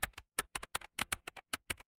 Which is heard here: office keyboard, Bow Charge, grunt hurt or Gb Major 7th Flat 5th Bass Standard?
office keyboard